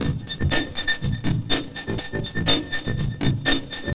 Trigger, Drums & Percussion Electronica Electronic; Sonic Foundry (Sony Creative Software) - Electronic Point Blank